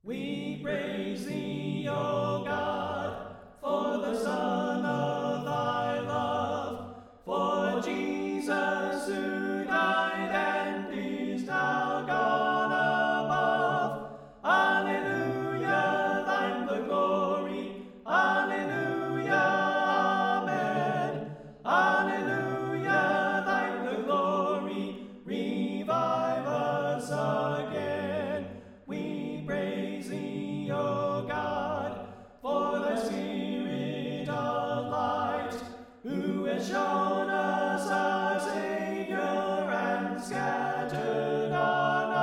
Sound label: We Praise Thee O God Alto Left